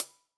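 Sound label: Sab Closed Hat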